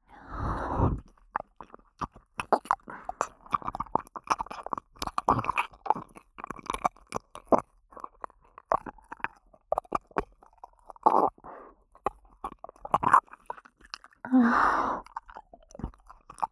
右耳舐め→左耳舐め, ASMR; アドリブ集

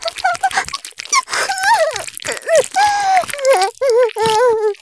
絶頂 潮吹き, ASMR; 騎乗位

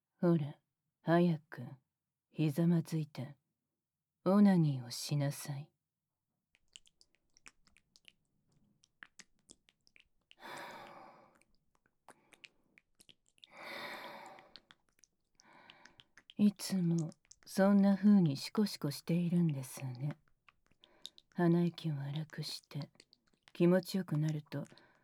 ささやき耳舐め; ドSシスター; オナサポ

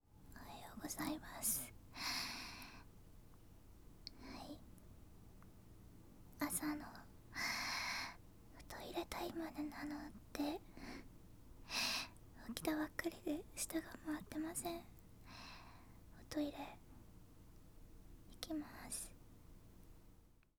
朝起きておしっこへ; 少女の寝起きおしっこ一番搾り; バイノーラル; 寝起きおしっこ11日分

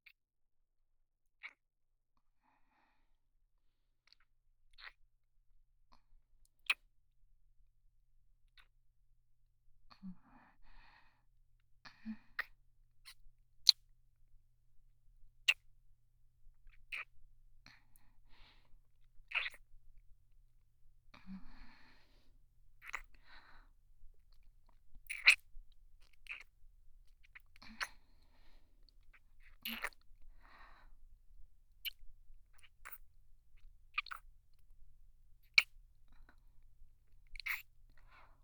唾液を絡ませてディープキス、そしてオナニー, ASMR; 体液越しの幽霊ちゃん

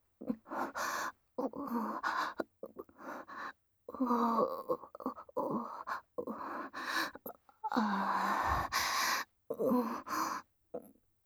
汗だくオホセックスループトラック, ASMR; だらだらスローライフ; 高身長バレー部むちむち爆乳彼女との、真夏の蒸し蒸し汗だく溺愛搾精えっち

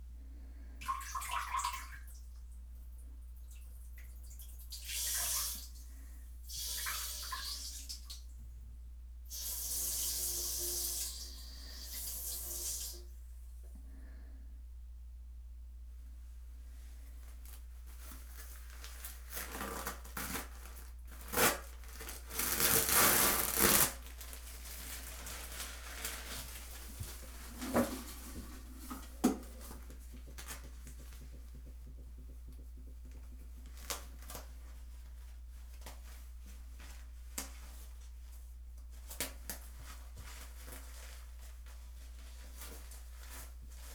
おしっこ 特殊 おしっこ後ナプキンを変える, トイレ盗聴 排泄音

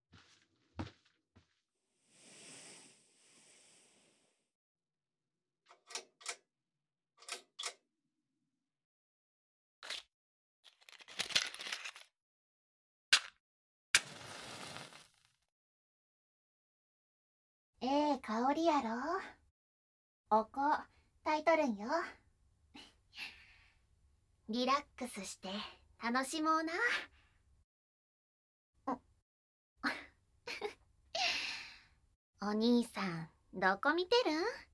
キス・耳舐め・チン嗅ぎ・素股・本番・お掃除, 関西弁; SE有り

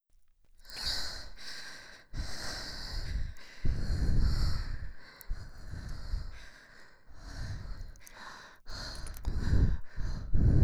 耳舐め＋オナニーボイス, ASMR; 脳蕩耳舐め; W黒ギャルからのディープな耳舐めプレゼント

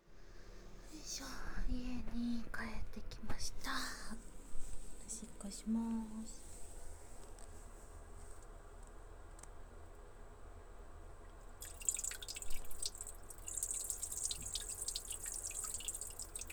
お家のトイレでオシッコ

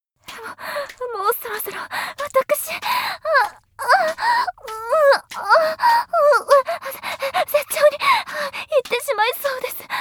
クライマックス らぶらぶ, ASMR; 清楚ビッチエルフ姫のメガ盛りマントロフルコース

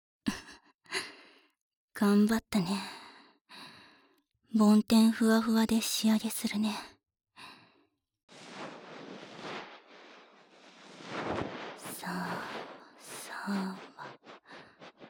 【右】梵天, ASMR; 耳かき

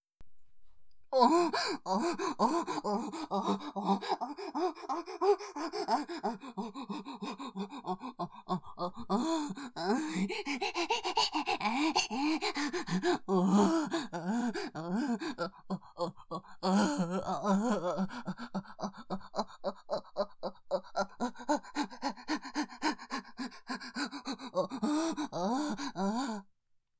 おほ声; シスターが祓う穢れ

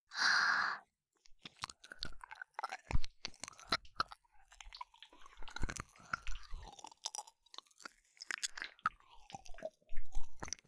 耳舐め 手コキ, ASMR; アドリブ集

